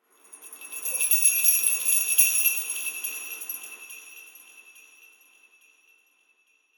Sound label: Jingle Bells